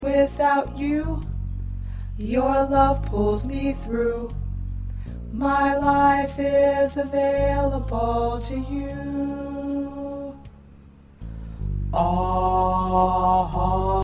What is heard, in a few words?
Tenor, choir parts